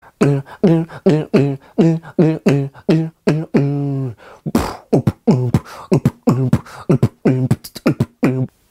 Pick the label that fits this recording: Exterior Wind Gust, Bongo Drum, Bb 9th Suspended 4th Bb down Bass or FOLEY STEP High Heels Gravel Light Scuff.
Bongo Drum